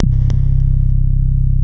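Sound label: wall moog, bass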